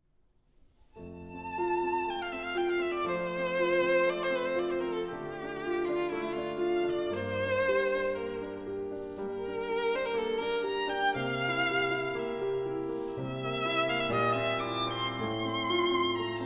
Violin Sonata in F Major, Allegro, Piano and Violin; Beethoven Sonatas for Piano and Violin